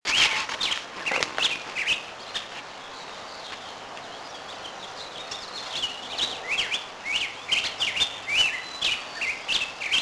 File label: Birdsong Grosbeak